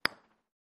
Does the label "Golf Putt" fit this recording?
Yes